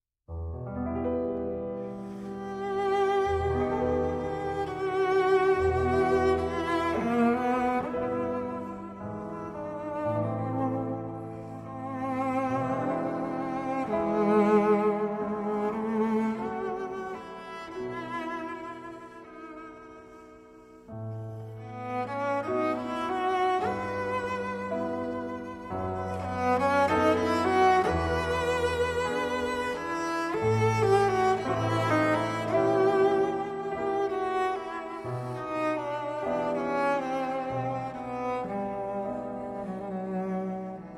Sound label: Autumn Song for Cello and Piano